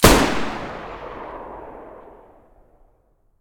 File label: muzzle raging mono, weapons taurus